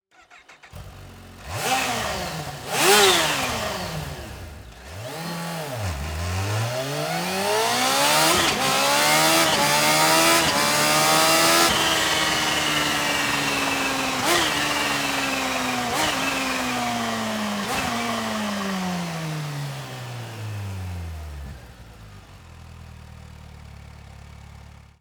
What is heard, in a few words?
Stock Exhaust Ninja H2 SX